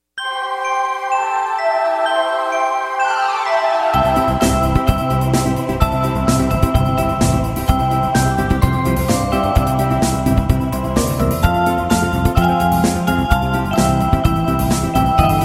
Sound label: Jingle Bells; Christmas